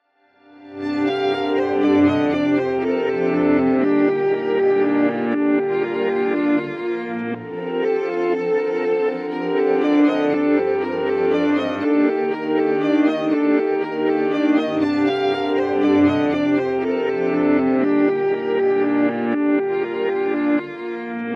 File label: Old Piano Drama Theme Reversed